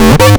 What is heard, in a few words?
Acid Lead; free techno acid samples